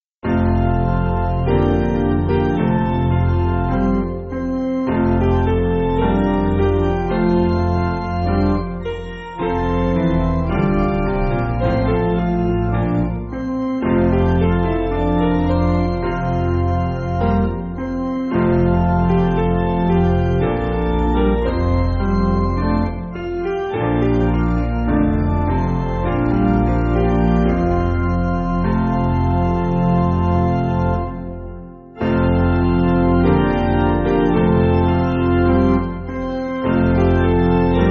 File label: Canon Piano